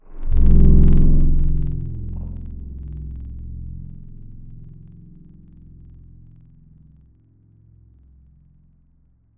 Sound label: Clarinet; Synthetic's Serum Collection; Echo Sound Works Core Tables; ESW Real